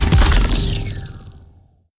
mag blast

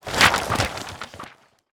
book page flap

